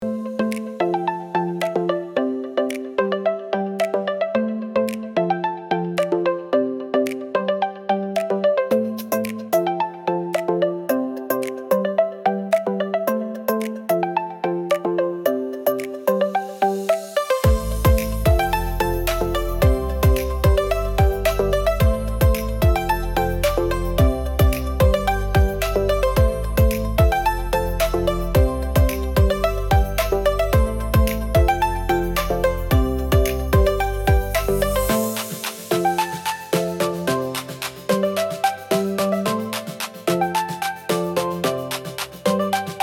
Uplifting pop beat feeling good

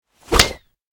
Cartoon slap; cartoon impact

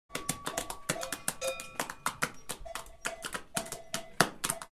Horse gallops among cows

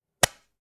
Whiteboard marker office stationery close

